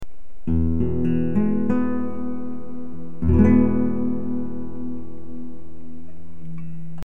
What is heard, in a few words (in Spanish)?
acorde mi, guitarra